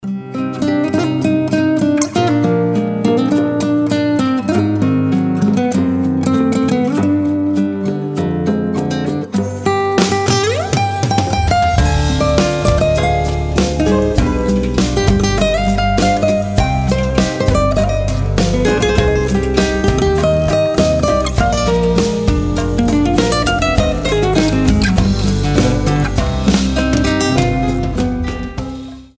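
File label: Spanish rhythm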